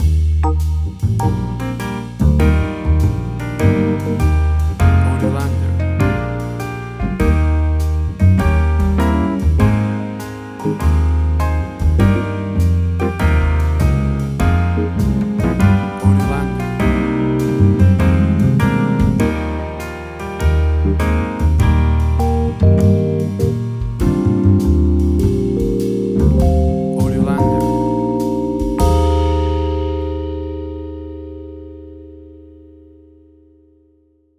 Auld Lang Syne Christmas Jazz

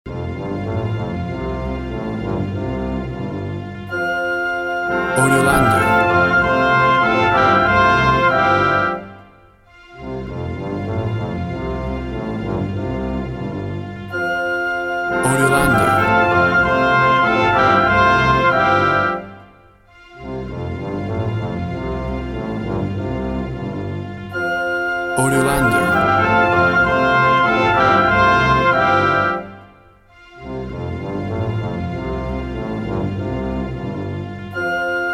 Triumphant And Bold Classical Music

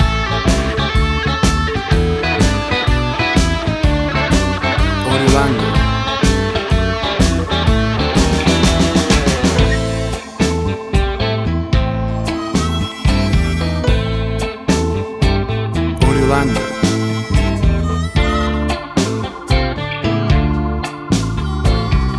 Blues Tango

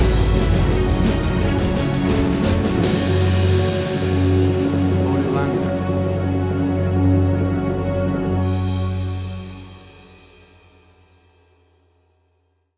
Big Action Movie Trailer Sound Stinger; AUDIOLANDER

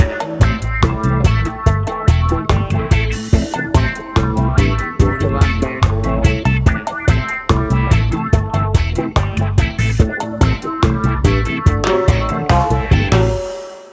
Cool Reggae Funk Guitar Fusion Music

